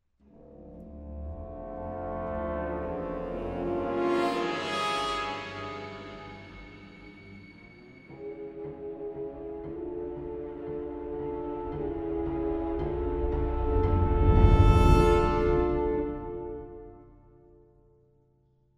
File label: piano escape; enter the matrix deluxe; video game score